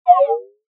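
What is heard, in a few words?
sound of text appearing or disappearing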